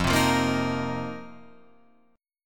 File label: F 7th Suspended 4th F down Guitar Open F; strummed; JGuitar